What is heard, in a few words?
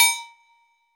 Agogo L Mild; Roland BK-5; Percussion